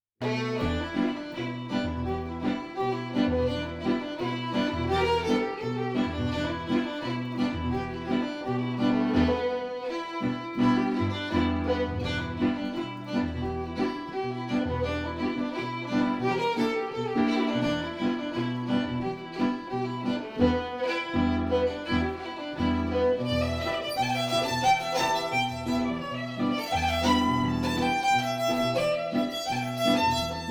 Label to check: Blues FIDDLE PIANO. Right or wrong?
Right